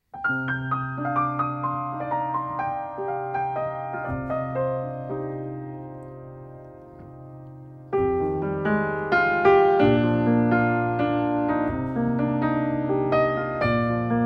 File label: INSTRUMENTAL